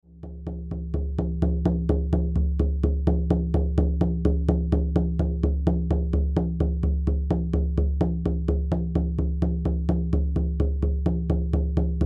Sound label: sdrum, shamanic